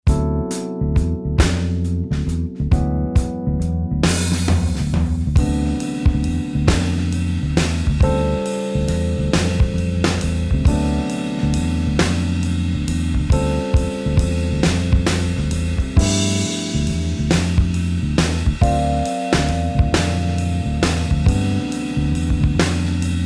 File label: improvising backing